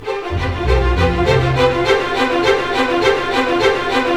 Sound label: BIKE; East West; Scoring Tools; ORC; Bike Ride F